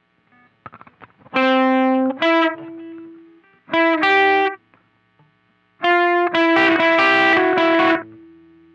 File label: Riff Slow